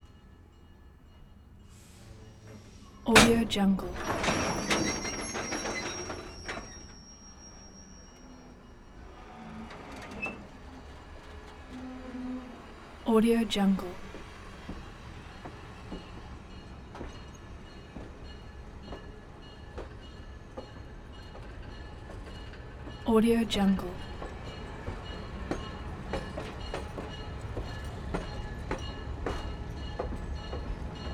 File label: train slow push away